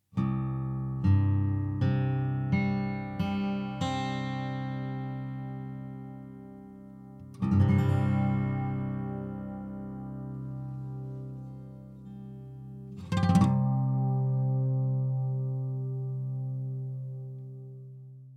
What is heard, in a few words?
Dulcimer Tuning; ragajunglism